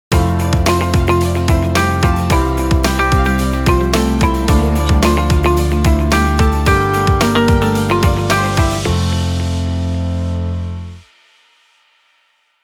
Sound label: medical logo